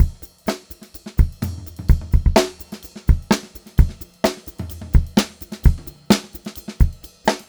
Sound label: 128 GR VAR, Funk Groove Drumloops; USB Soundscan vol.38; AKAI; 90 s Sample CDs; 128 GROOVY